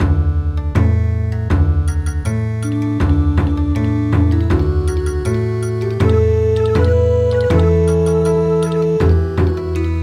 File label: Bon Odori